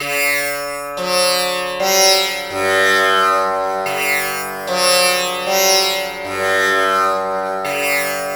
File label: TAMPURA; Spectrasonics; Heart of Asia